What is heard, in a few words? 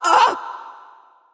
Wound; Julianne